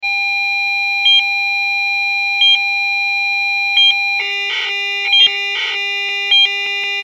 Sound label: Heart Monitor; Steady 3; EKG Heart Monitor; Regular Repeating High Pitched Beep With Lower Pitched ( Modem-like ) Drone, Close Perspective. Hospital.